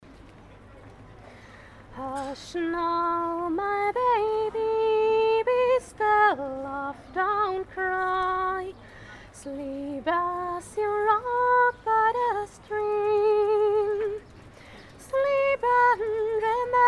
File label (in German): Schlaflied